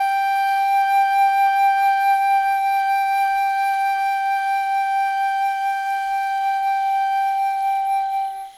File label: flute G6; 音MAD; 悠哉日常大王